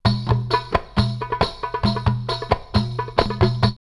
Palmyra 134, drumloops World Ethnic; X-Static Goldmine

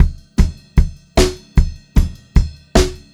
152 SPEED TOM, Pop-Rock Drumloops; USB Soundscan vol.37; AKAI; 90 s Sample CDs

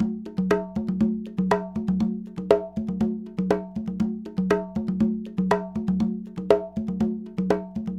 Conga, Latin Percussion

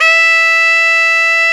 SAX TENOR

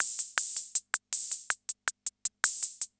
CR78; Best Service; XX Large Most Wanted

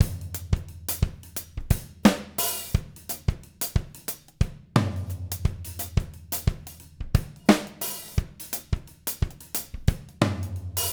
176 BO, Living Drums; Sampleheads; Peter Erskine

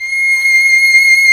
VIOLIN C6

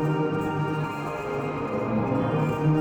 Tonal Re Draw, sparse soundscape

